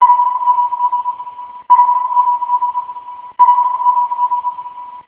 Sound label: Sonar